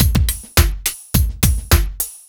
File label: Beat, Drums, Eclectic Electronica